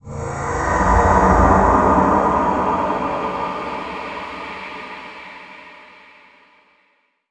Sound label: Creepy Stinger